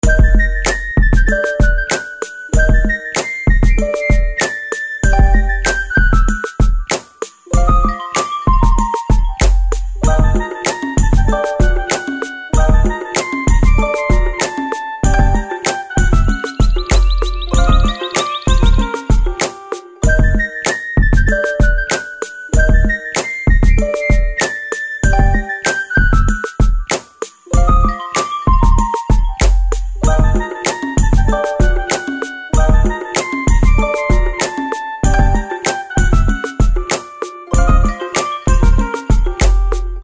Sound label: flute